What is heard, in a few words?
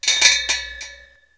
klang